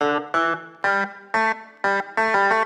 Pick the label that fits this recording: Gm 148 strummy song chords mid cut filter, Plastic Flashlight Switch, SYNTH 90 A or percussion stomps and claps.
SYNTH 90 A